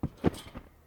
trampoline realistic

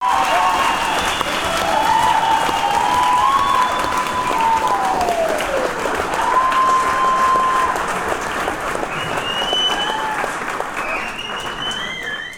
nightclub audience applause cheers; sonniss gdc

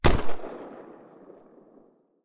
revolver single fire; ranged weapons guns; psfx

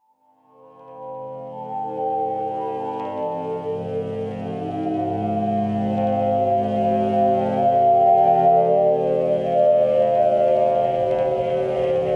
drone rising skys; sonniss gdc

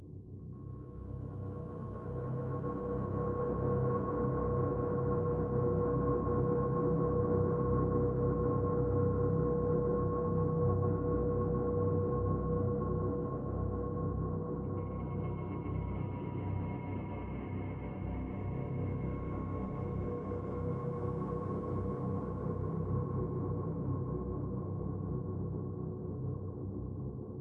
space ambiences; sonniss gdc

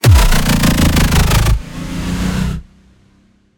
cinematic impact; sonniss gdc

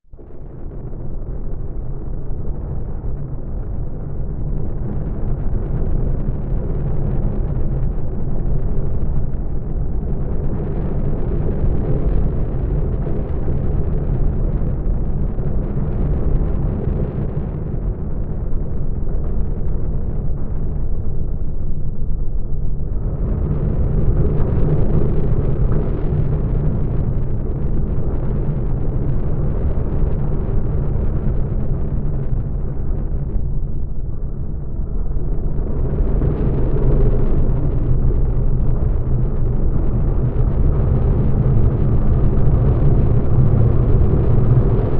metal souls ambient drone volcanic eruption; sonniss gdc

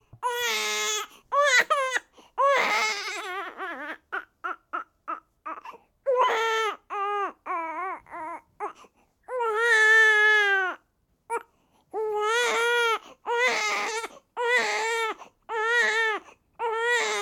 baby 15 days crying hard; sonniss gdc

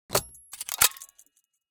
latch locker design future loot score plunder; sonniss gdc

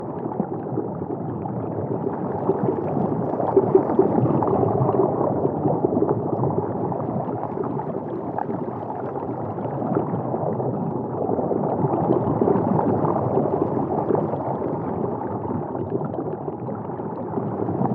Underwater; Submersion; SaintDuran

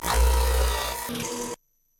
designed mecha elements mechanics; sonniss gdc

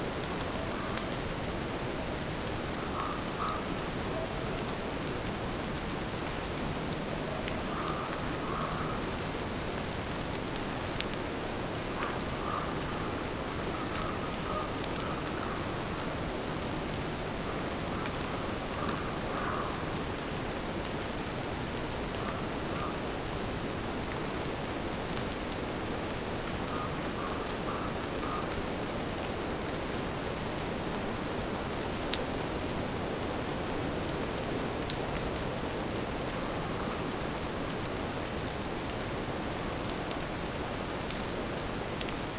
rainy day with distant bird and a lone wolf; sonniss gdc

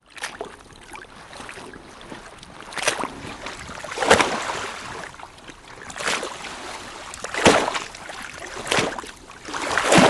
lake wave ambience; sonniss gdc

